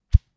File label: subtle Woosh